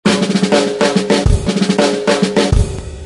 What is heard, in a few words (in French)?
signal normalise -6 RMS; Normaliser